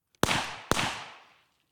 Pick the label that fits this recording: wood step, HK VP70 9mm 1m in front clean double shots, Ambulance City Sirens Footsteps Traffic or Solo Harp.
HK VP70 9mm 1m in front clean double shots